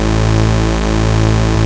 OSCAR A2, Synthesizer; Hollywood Edge - Giorgio Moroder Rare Synthesizer Collection

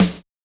Yamaha DJX 2B, SD Room H

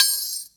Sizzle; EMU Orbit-3

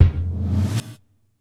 Gated Tom; Univerb; creative gate; Gated Reverb

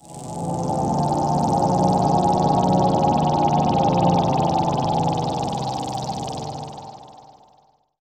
Storm Siren; Zero-G Smoke

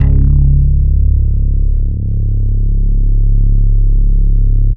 Synth Bass; Bass X

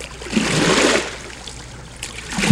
ROW, Boat Rowing

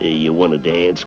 VOX; Zero G; Man Machine

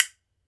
B. BONES, Celtic Percussion; E-MU Producer Series Vol. 7 – Old World Instruments

